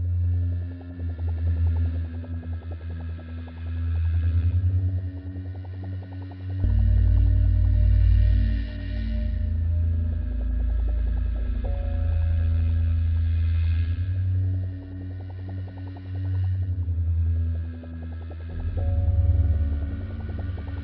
creepy loop

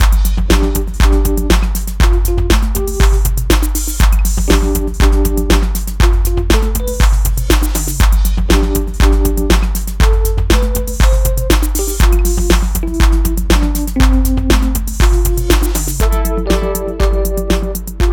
Chilled Tune

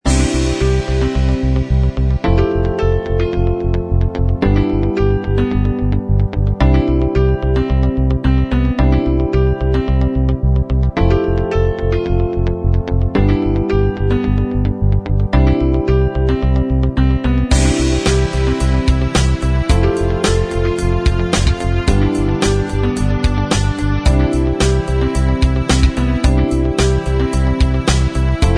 110 BPM; Discovering New Frontiers

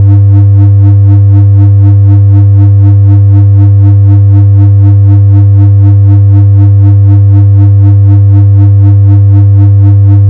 spaceship engine

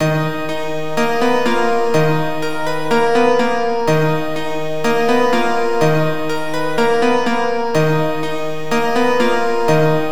Rain Castle

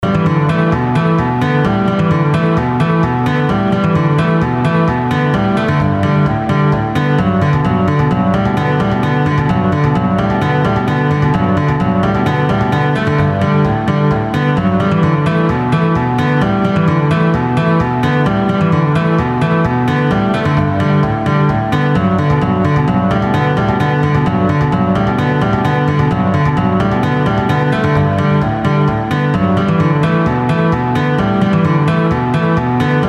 steady Rhythm

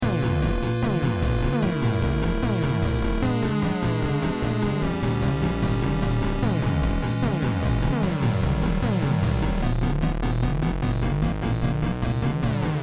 bossa

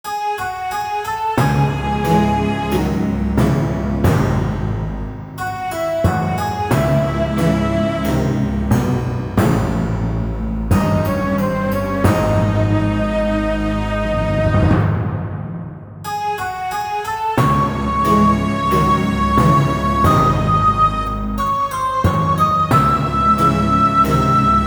Baroque; Opusendo

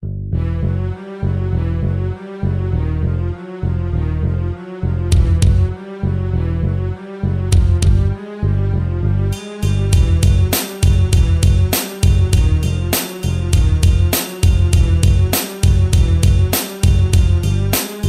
Bass; Toxic Skinnies

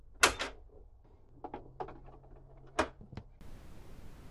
vinyl start

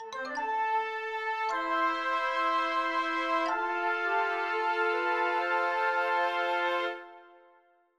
win music

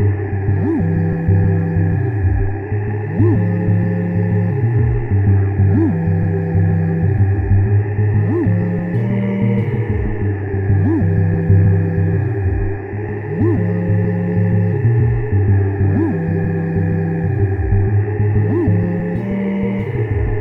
future tribal

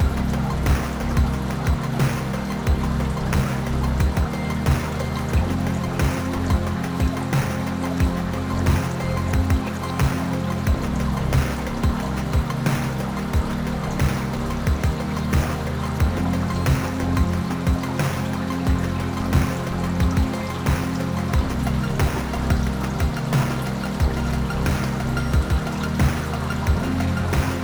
raining loop

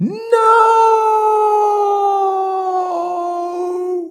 Die Sound

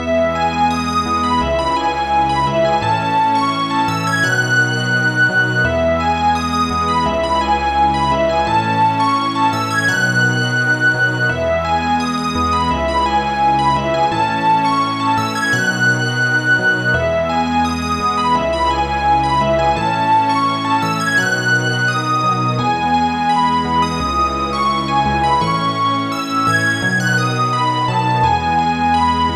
upbeat instrumental ambient music; Beautiful City

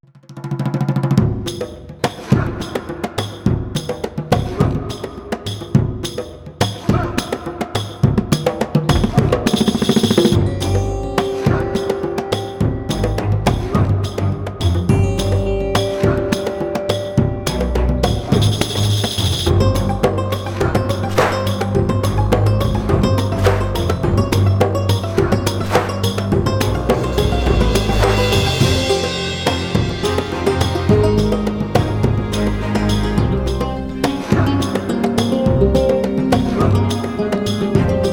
battle

